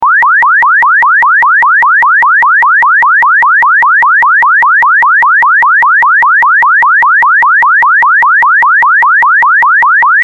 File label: chirp; chirp toys